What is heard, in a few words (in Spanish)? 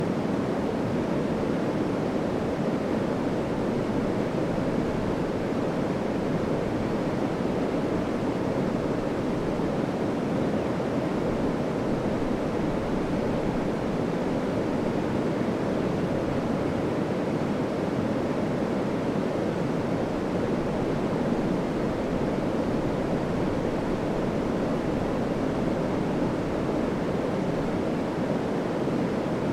rio Huancabamba, Piura; Río Huancabamba, Piura, 2019